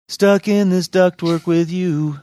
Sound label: singing stuck in this ductwork with you